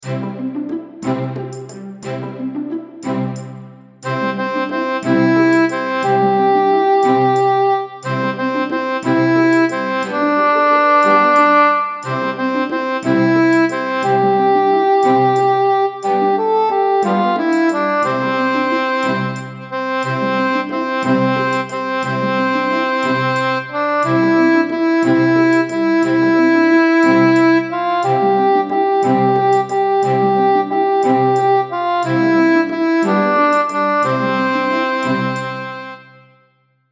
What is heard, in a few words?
Here we Go Looby Loo slow tempo; ukulele; Rainbow Ukulele Play-Along